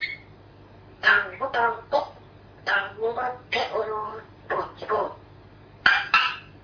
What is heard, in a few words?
dango song